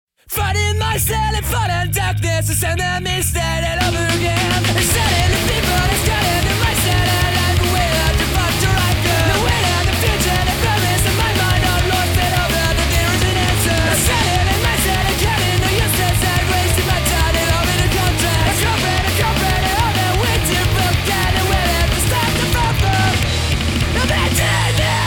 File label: Radio Music; let it die